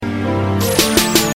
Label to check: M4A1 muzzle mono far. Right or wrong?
Wrong